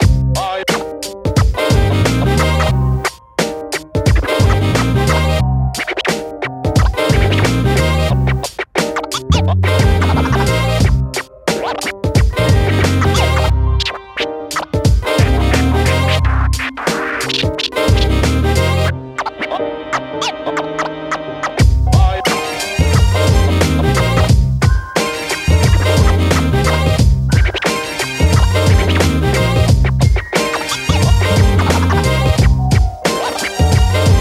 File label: Neon boulevard Arcade Loop; Jitsu Squad; ps4 ps5 switch windows xbox one xbox series xs